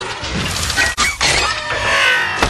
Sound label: transform sound; transformers